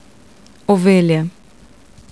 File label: sheep